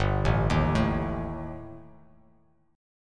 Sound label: G Suspended 2nd Sharp 5th, Bass Standard; arpeggiated; JGuitar